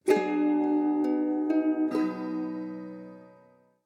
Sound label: Tuneup, notifications